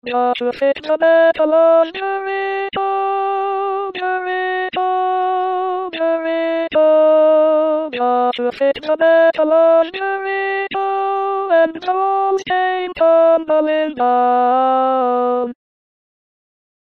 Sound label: Alto